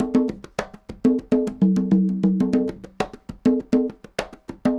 conga 100 bpm, latin; Sound Effect Library

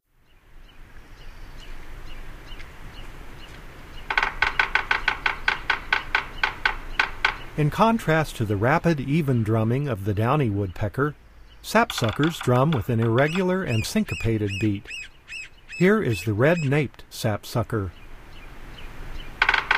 Red-naped Sapsucker, Bird Sounds; North America; Common Western Species; Know Your Bird Sounds

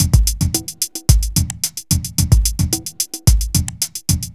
r n b kit 110h

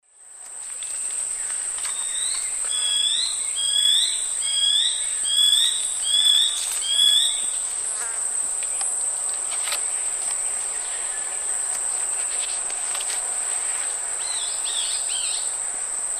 Myrmotherula l. longipennis, song and call; S. America; Eastern Lowlands

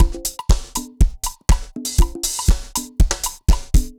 dancefloor perc 120 bpm

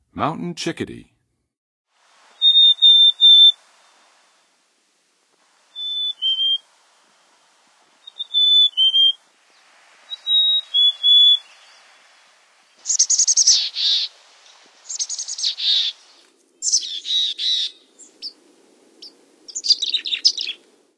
Mountain Chickadee, Songs of Birds; North America; Western USA; Western USA Songbirds

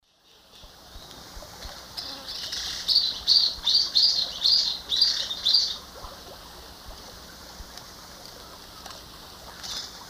Ochthornis littoralis, calls or song; S. America; Eastern Lowlands

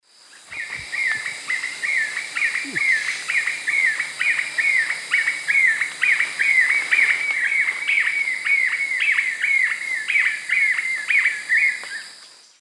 Monasa flavirostris, song; S. America; Eastern Lowlands

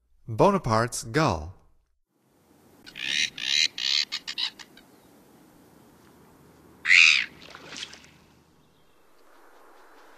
Bonapartes Gull; North America; Stokes

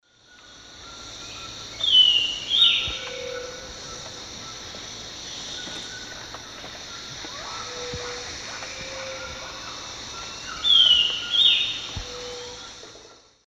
Corythopis torquatus, song; S. America; Eastern Lowlands

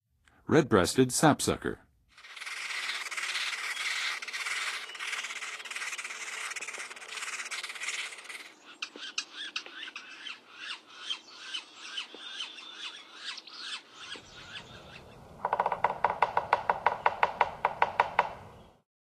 Red-breasted Sapsucker, Western USA Songbirds; North America

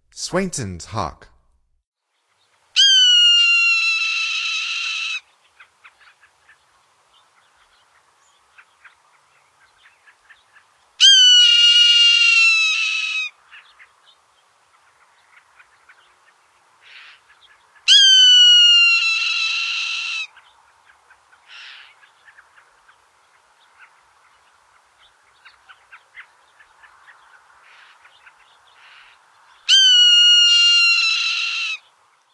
Swainsons Hawk; North America; Stokes

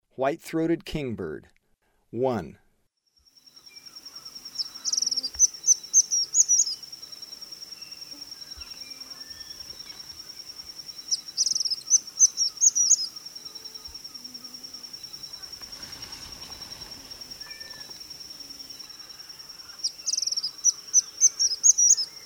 White-throated Kingbird; S. America; Eastern Lowlands

